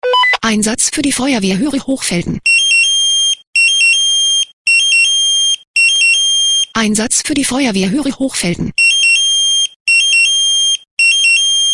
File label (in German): SMS Alarmierungstone; FW Hoeri